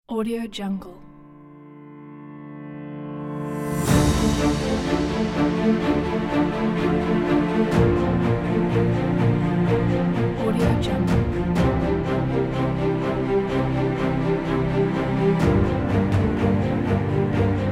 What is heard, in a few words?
heroic dramatic